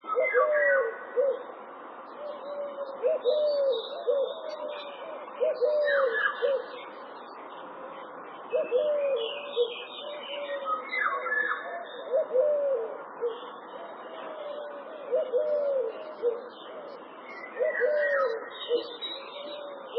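pshift bird sound, shift of frequencies above 1500 Hz with ratio=-8 semitones and offset=0 Hz, after compression and decompression by the VLC HQ 48 codec at 96000 bps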